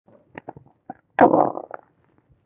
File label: gurgle; shweets sim; snivy